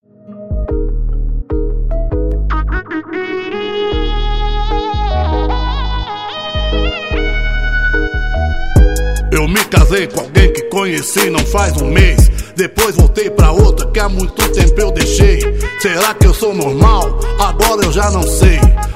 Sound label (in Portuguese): rap